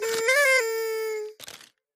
win trumpet; Hot Party Deluxe; Wazdan

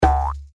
reelspin click; Joker Slot; slot game

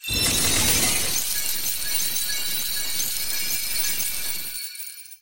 Coin spray; Thunder Fighter

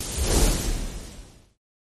popup appear; games; Aztec Gold Megaways

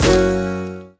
stop scatter on reels; Wild Guns

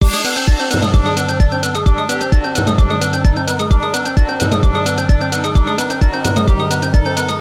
count up; Book Of Lords

